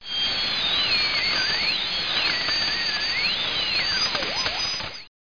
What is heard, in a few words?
static; Broderbund Print Shop Multimedia Organizer Deluxe Edition